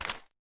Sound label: Pocket Walkie Talkie